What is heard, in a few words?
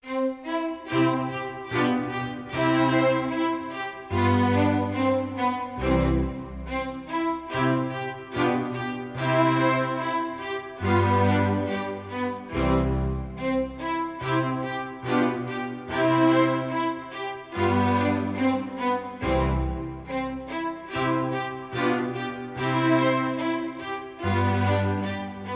Country Dance in C, Str Orch; Mozart